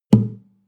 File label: western guitar drum single low